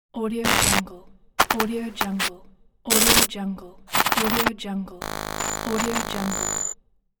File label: glitch noise